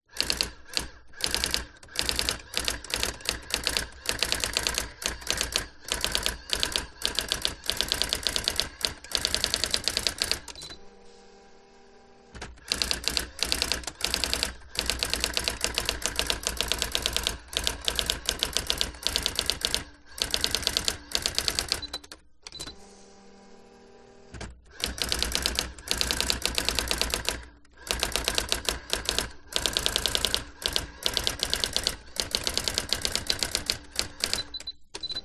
electronic typewriter typing fast sequence brother ax 450; office